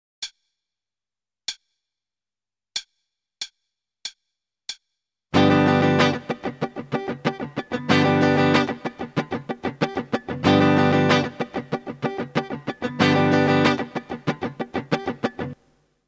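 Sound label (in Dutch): Ritme, gitaarstarter; Meespeel CD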